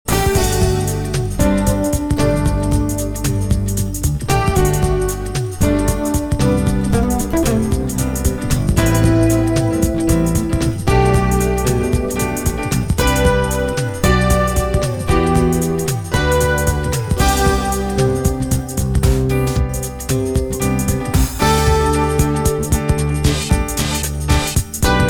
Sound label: Contemporary Grooves; Telefonanlage